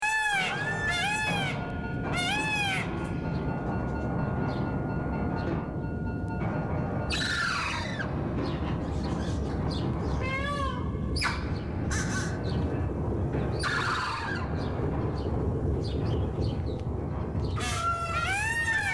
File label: Theme Song; Tiki Gardens